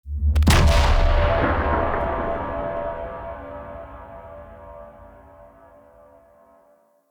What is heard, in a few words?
Dropship Warp In Boom; Venus